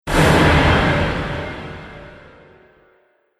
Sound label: Fantasy Explosive Impact; Miscellaneous Impact Sound Effects